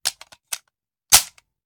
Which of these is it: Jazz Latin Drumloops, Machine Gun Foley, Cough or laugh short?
Machine Gun Foley